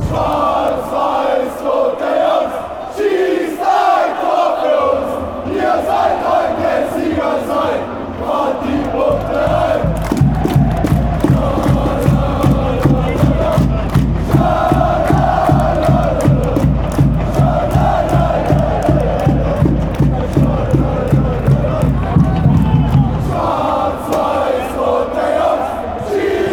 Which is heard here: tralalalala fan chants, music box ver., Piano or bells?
tralalalala fan chants